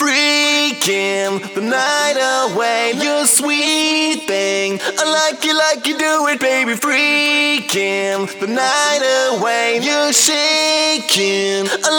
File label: Pitched Vocals Chorus WET, 140 BPM; Vengeance Samples; Song Kits